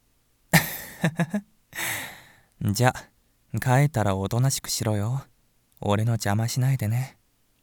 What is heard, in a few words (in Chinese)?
哈哈哈; 回去了瓜要乖哦